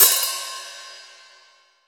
DRUM KITS; InVision Interactive; 40 Oz Phat Slammin Hip Hop

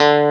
Elec. Guitar D2; Proteus 2000; Digital Sound Factory Proteus 2000

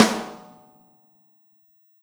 Snare Shard

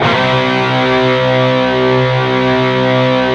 PRS, Guitars, PWR SLID

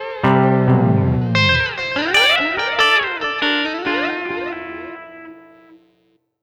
Guitar Cluster 141-C, Lounge Atmospherez

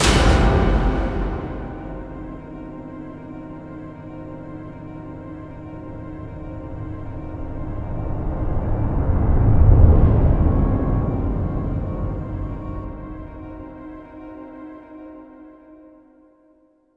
CLUSTER SLAM; Spectrasonic Distorted Reality 1

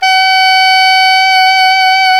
SAX ALTO MP, Alto Tube; Roland LCDP07 Super Sax

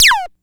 Click and Whirl one shot, SFX; Sonic Foundry (Sony Creative Software); Electronic Point Blank

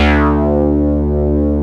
OB FILTER D2; E-MU Formula 4000 Series Vol. 3; Analog Odyssey

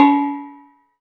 Bonang Open D3; Planet Earth; Digital Sound Factory Planet Earth

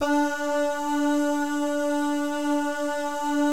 BAH GUY, Jazz FX; Spectrasonics; Vocal Planet; JAZZCOMBO

